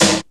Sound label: noisy steel snare drum d sharp key